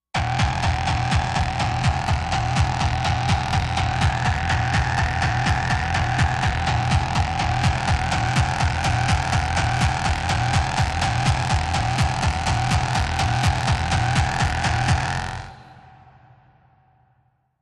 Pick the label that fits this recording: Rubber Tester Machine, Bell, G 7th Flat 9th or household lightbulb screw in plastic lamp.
Rubber Tester Machine